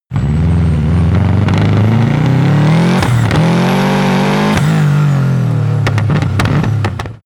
car subaru impreza acceleration short deceleration